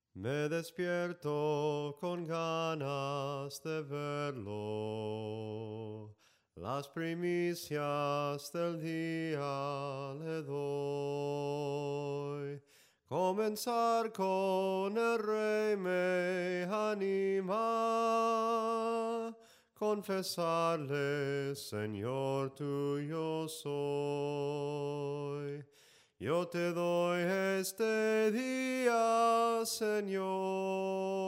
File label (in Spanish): SOPRANO CANTADO, himnos en melodia soprano